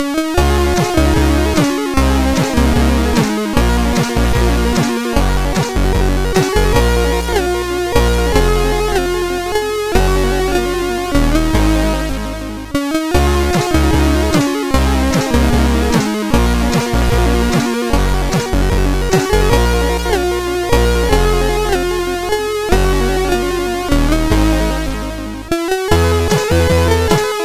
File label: Slow Melody, MOS6581R4; sid